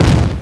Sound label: EXPLO; CYBERSPD